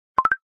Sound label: notification sound ringtone; android; snapchat